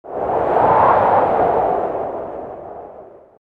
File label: wind gust; Garry's Mod; tf2